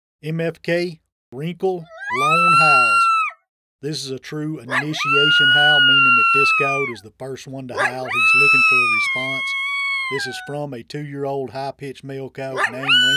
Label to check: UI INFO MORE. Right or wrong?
Wrong